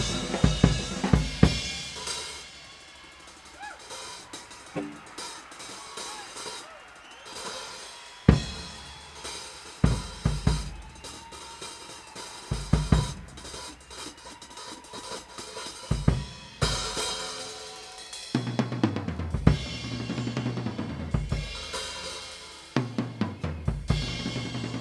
Drums; Boston Music Hall, Boston, MA

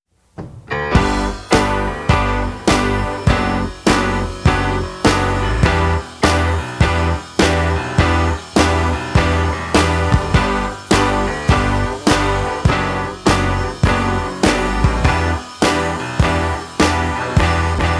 Framed, Lying, Crying (instrumental Segue)

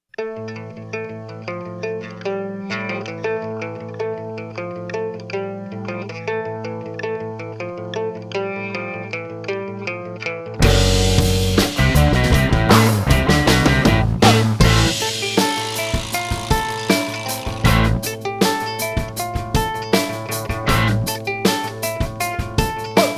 (Instrumental)

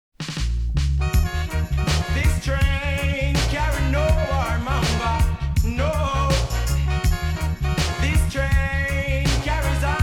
Dub

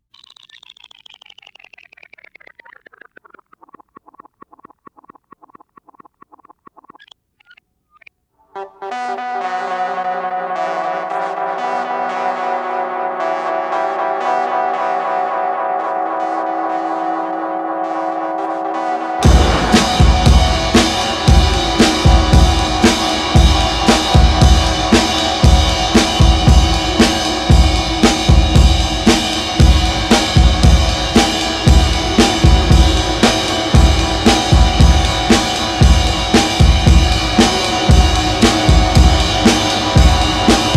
Drums for Sale

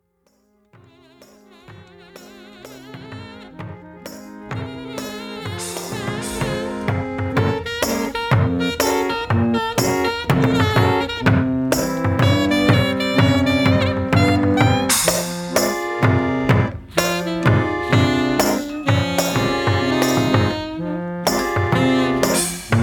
(instrumental)

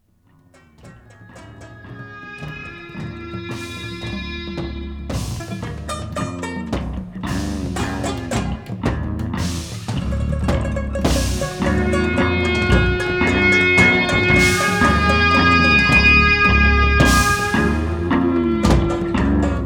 Waltz

